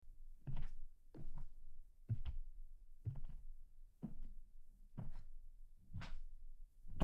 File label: Wooden Foosteps; horror sfx vol 2